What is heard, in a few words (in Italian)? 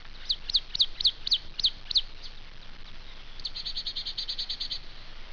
Cincia bigia; fauna